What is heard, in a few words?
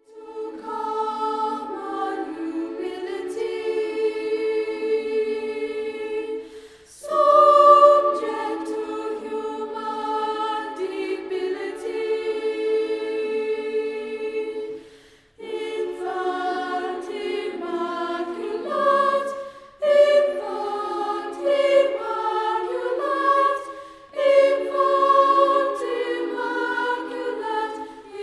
Childrens Choir Childrens Hymn; Hymn To The Infant